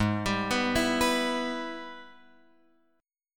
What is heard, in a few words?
G sharp Minor Major 7th Sharp 5th G sharp; G#mM7#5 Chord {4 X 5 4 5 7} arpeggiated